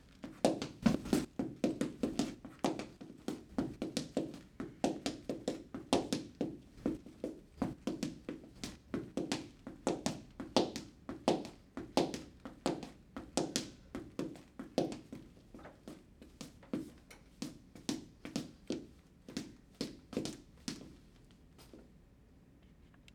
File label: Dance